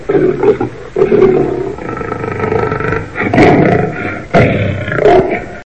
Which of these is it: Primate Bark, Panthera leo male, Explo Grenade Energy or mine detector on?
Panthera leo male